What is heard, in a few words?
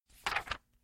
Page Turn